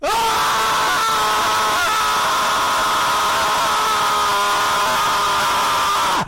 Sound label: scream; guest 1337